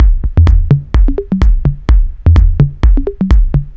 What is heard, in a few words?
Minimal House 127 bpm, Drums Full Loops; Ableton Live 12 Lite; Core Library